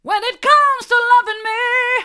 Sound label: mid, vocal